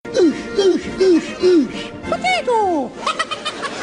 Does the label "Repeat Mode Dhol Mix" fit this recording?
No